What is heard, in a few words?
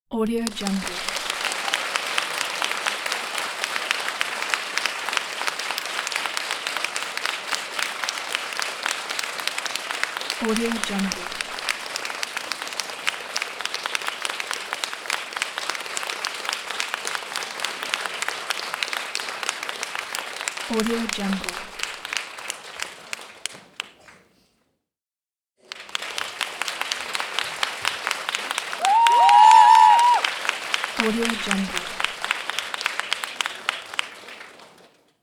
show audience applause